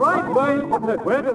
walla; DAoC

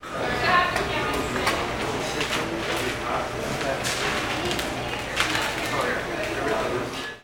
Building Fast Food; Heist

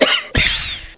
COUGH

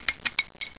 light chain

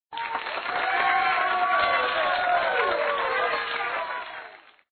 cheering; Together in Jesus - First Reconciliation - Computer Fun; word snatcher

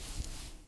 foley cloth short; Earth and Beyond; EA Games

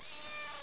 meow; 12000 Graphics Pack - A Complete Graphics Collection For Your PC

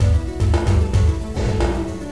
Music loop; MacAttack

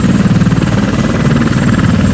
tank; Panzer General 3D

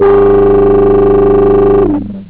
terror, synth

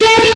FX shot, Drum+Bass, 160; Singleshots

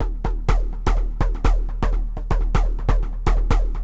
XTRA HOUSE 125

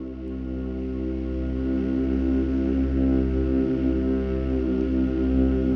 ethno 125; eJay

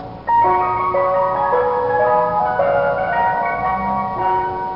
ice cream van

